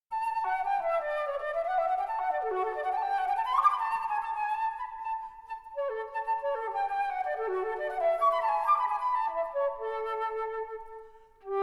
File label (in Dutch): Dwarsfluit; Instrumenten orkest